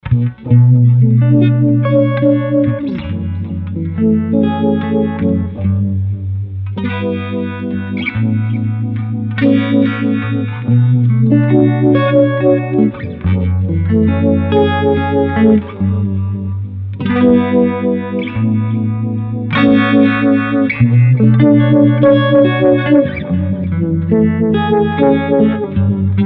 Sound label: lo intensity fast slow